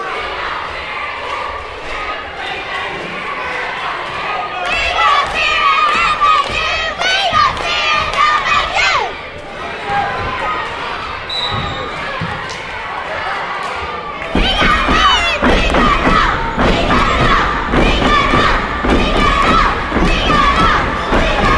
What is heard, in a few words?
child's happiness